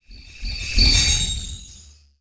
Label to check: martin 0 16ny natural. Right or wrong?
Wrong